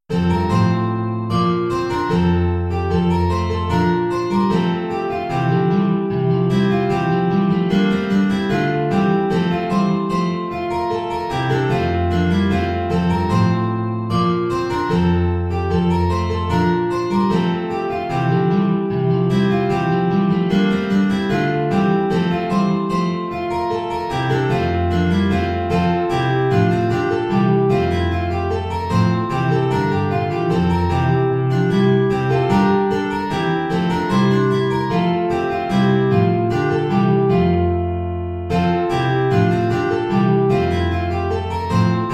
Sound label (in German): 4 Gitarren; Ballet des baccanales